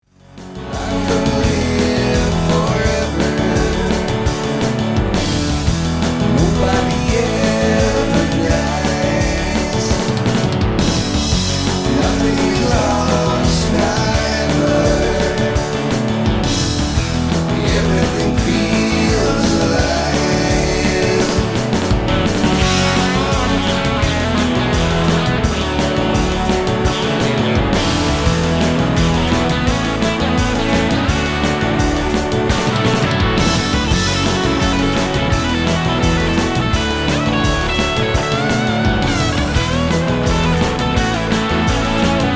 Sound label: Chorus Lead